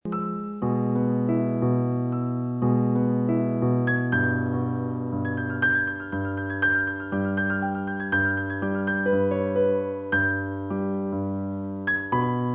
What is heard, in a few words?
Piano